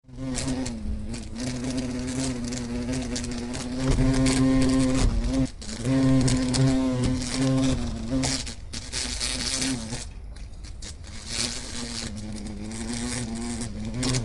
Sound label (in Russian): zvuk shershnya